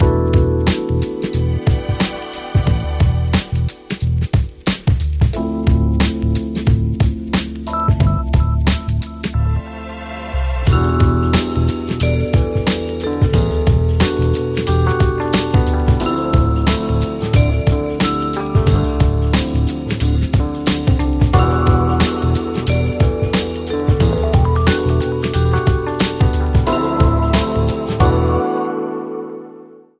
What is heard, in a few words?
Chill Urban Atmosphere